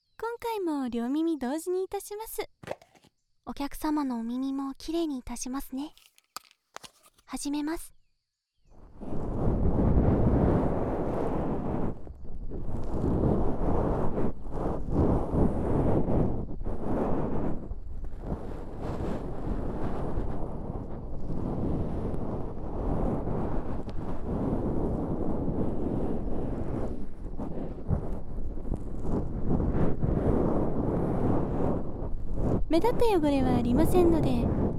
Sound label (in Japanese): シャワー 綿棒 で 耳かき